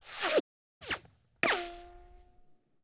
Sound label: bullet shot air